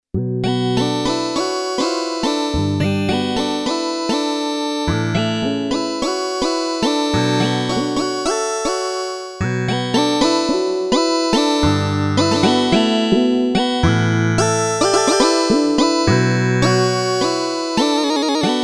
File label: 5TH SYNTH, Korg DW-8000; Factory Patches